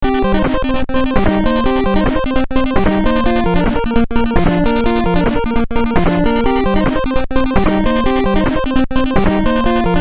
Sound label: NES FPGA; fpga nes audio